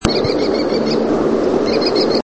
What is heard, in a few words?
american Kestrel